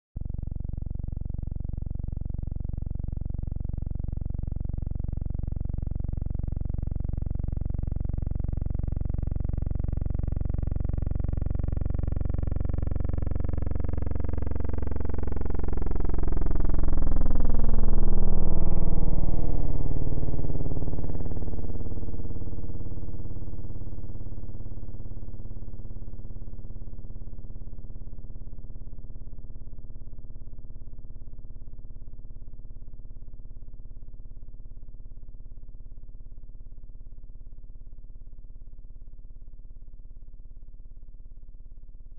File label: ground Observer, Main Rotor; EPNL Optimized